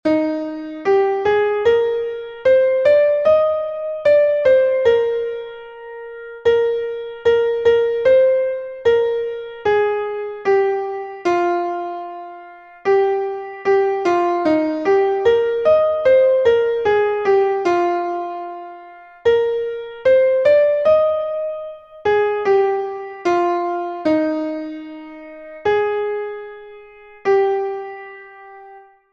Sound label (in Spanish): soprano, himnos en piano; 47 CANTAD ALEGRES AL SENOR; cantos del camino